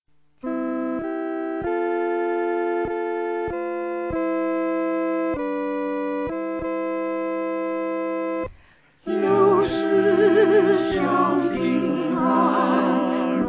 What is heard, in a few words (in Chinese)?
合唱 四声部